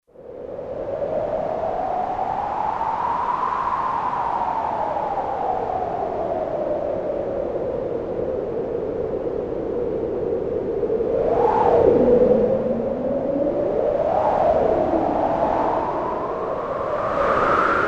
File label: Northern Winds, Kurzweil K2500X; Factory Programs